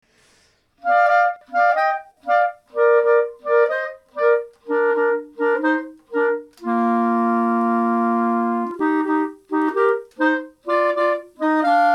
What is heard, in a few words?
Caribbean Duet, saxo; Horen Lezen en Spelen deel 2